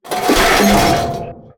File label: objects, World of Tanks